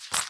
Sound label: Currency Cash Flyoff; Taps to Riches; Android